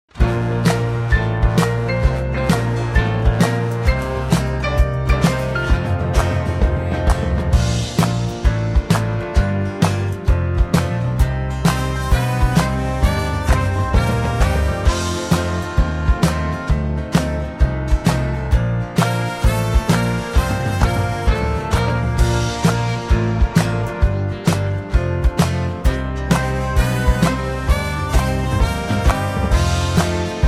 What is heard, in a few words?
Bb No Backing Vocals, backing tracks